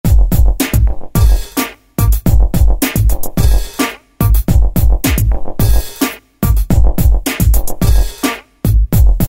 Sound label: kit; E-mu PK-6